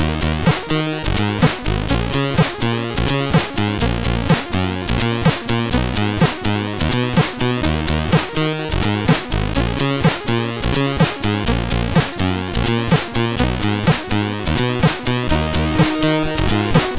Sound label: alt bass, sid CSG8580R5; hvsc; Moon